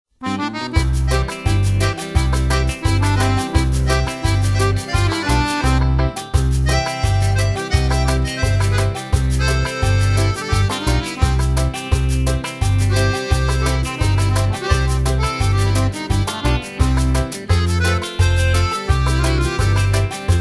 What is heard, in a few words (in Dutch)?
Jig Jog instrumentaal